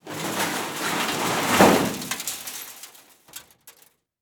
Crash Crane Crush Car; crashes; AdobeCC

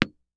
Sports Tennis Ball Single Bounce On Cement

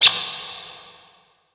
Multimedia Internet CD-Rom Flash Click Mechanical

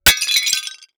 Impact Ceramic Tile Crashes On Cement; Impacts

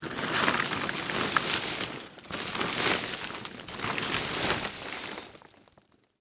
Tent Unfolded Moving On Dirt; Sports

